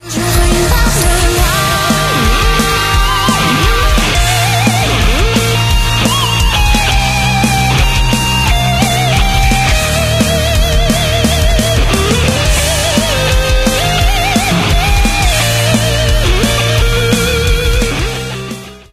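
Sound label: background music crossfade; Invaxion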